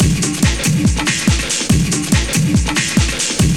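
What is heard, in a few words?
drum loop; X-Static Goldmine 3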